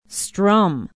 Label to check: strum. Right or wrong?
Right